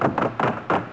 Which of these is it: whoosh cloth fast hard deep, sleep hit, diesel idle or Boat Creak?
Boat Creak